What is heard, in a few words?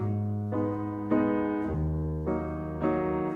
piano, Loops Sad